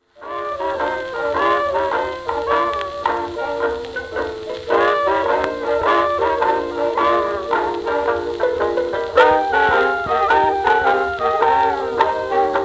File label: blues